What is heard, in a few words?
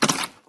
splash; openspace3d; vrcells